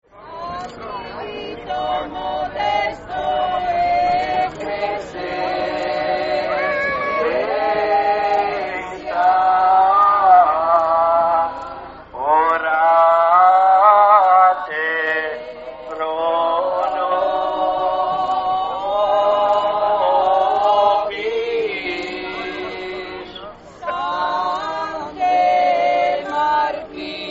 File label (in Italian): canto; marano